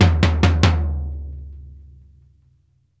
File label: FLOOR 8THS, TOMS; Sampleheads; New York City Drumworks VOL-1; SP TOMS